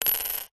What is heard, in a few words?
australian 5 cent coin drop on tiled table; foley; money